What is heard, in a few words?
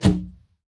T Shirt Cannon Fire